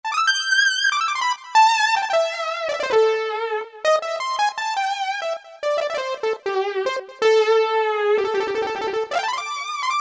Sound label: Monophonic Lead, Roland PN-D50-03